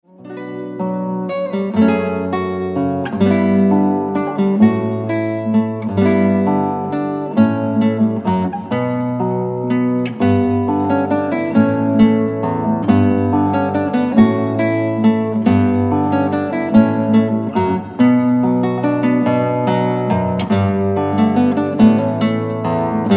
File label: Instrumental